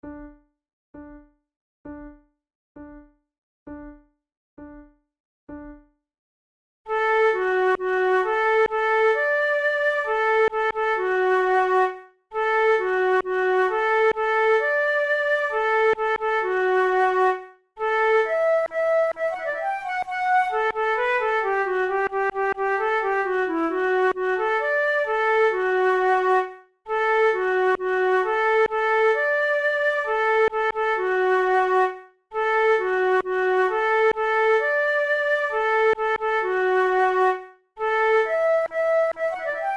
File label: duet; O pescator